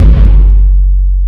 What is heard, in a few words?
Impact